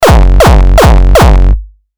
lazer; vital; lazer me

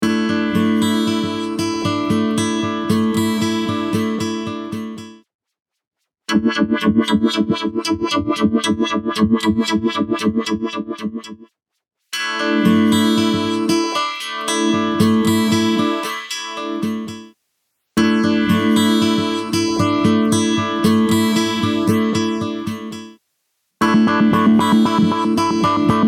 guitar; filtomika; cherry audio

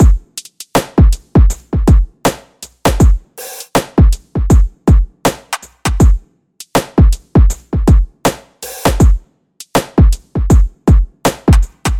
drum loop; monosounds; evoque

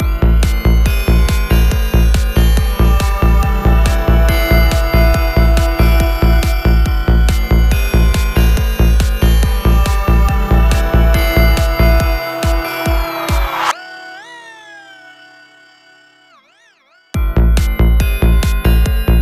pads; thatexture; 23dsp

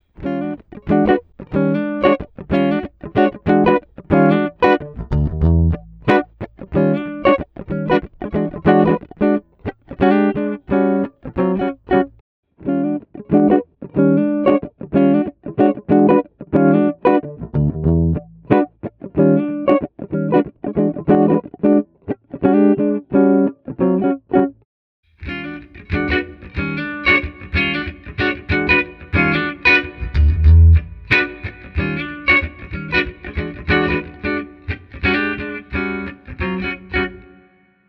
clean guitar; amp sim noir tones; sonatura audio

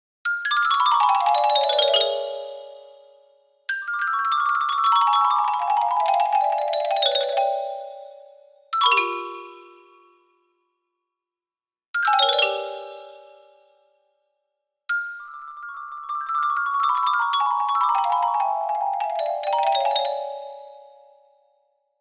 diminished runs; twinkle; sampleson

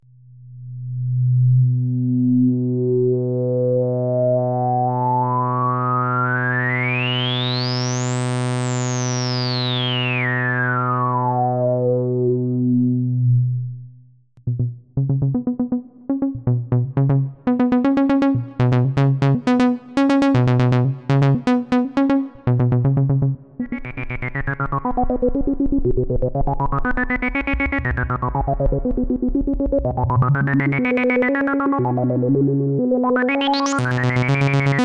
filter; substation for vcv rack; slime child audio

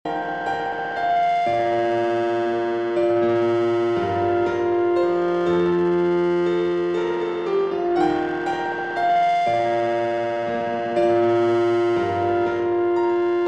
piano wet; soliton; chiral audio; promised neverland

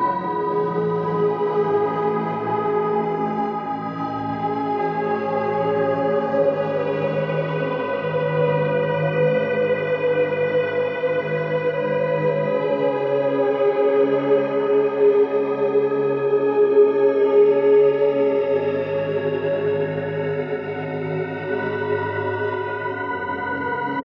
synth pad; wavefield

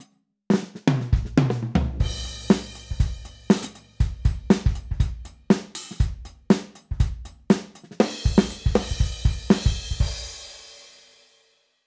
drums dry; modular effect board; sonic instruments

